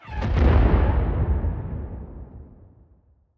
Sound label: skewer tails